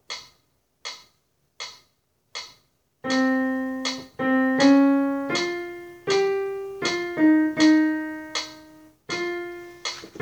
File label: Alto